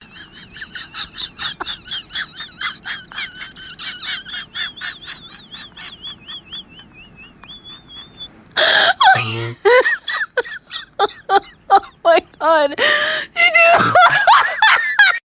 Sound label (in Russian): dikij rzhach